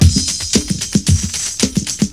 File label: jingle like, drum loops